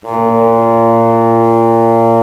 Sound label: ENSONIQ PIPE ORGAN BRASS